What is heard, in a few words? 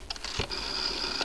DIAL; EDSAC